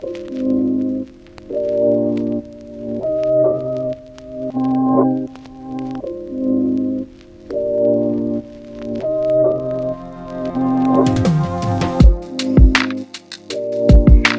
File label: hiphop